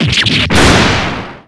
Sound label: break; patrick